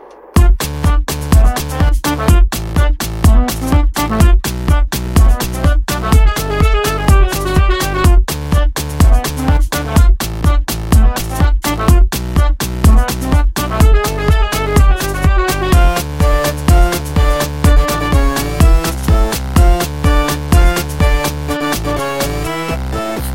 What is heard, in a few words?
Drum Beats